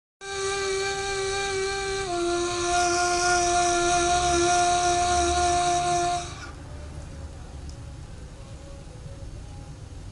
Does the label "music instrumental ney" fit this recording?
Yes